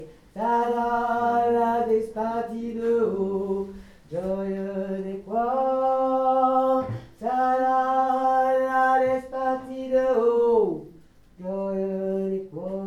VOIX; La Despartida

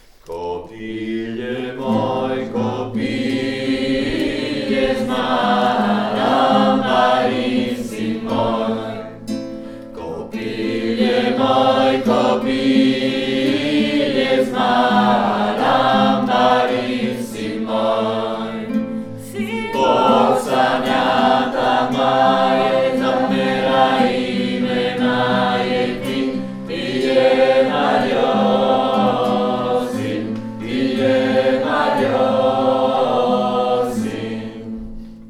VOIX 3; Kopile moj kopile

